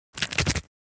Small creature monster pass by

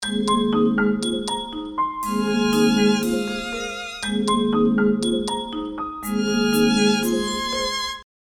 Smartphone ringtone modern call

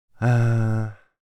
Man thinking hmm

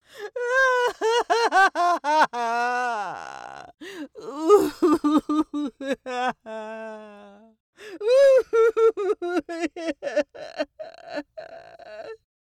Witch fantasy vocalization crying

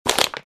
Plastic bottle crush

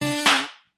Squash a mosquito

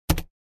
Keyboard key press mash

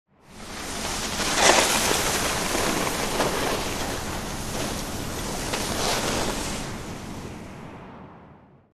Snowboard pass by on snow close perspective

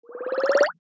Infographic data chart expand

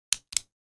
Pen office single click

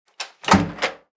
Microwave door open; appliances

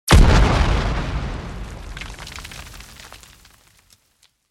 Grenade blast with flying debris; explosion

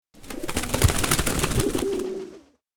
Pigeon flapping wings birds

